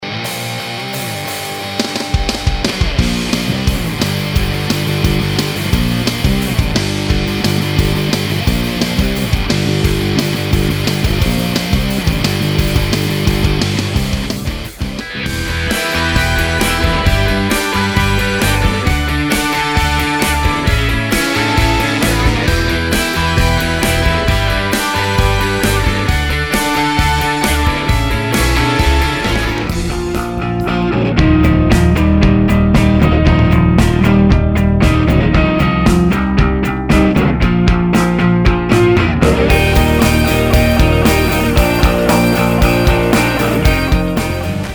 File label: Alternative Punk mix